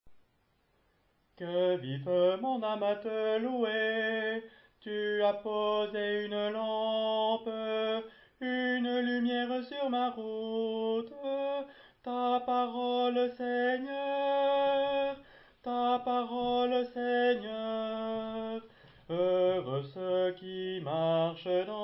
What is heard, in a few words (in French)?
Voix de ténor